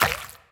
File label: puddle